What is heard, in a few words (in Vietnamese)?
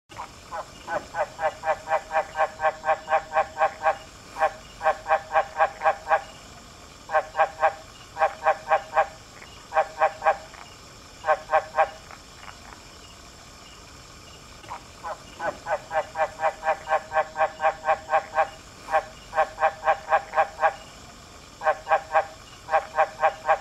Tieng Ech keu; Tieng Ech keu moi cuc chuan